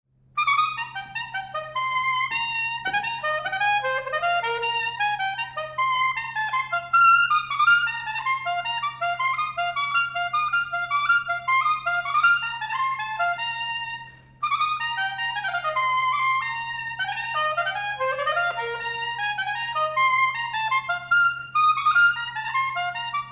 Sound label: Soprillo